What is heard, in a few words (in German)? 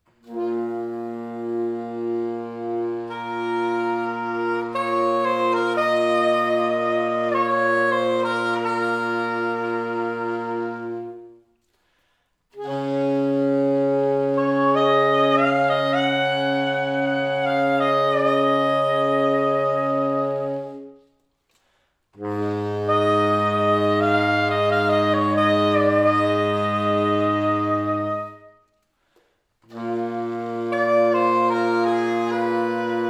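lettisches Volkslied, saxophone quartet; Rutoj Saule; N. Vitina/U. Leuenhagen; Lilienthaler Saxophon-Quartett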